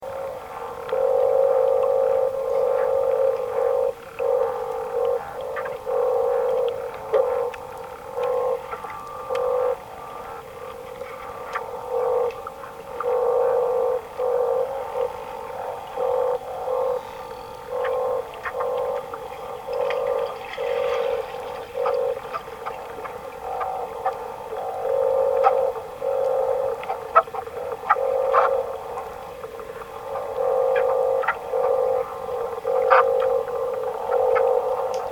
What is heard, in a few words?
subaqua amazonica corvina; donatus; klaus osterwald